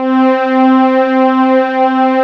silent dream, Korg O1/W; Rubber Chicken Software Sample Bank

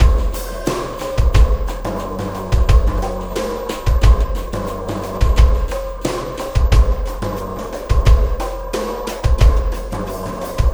Sky Lab Beat, Processed Drumkits

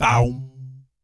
BS, Vocal BASS FX

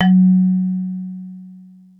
mallets

